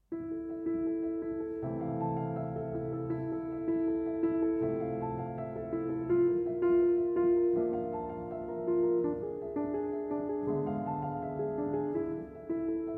For Piano Duet; Orphée; Act 2; IV. Chez Orphée

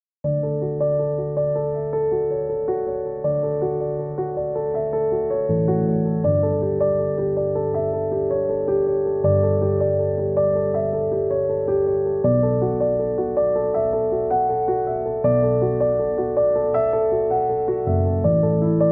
Inspirational Piano

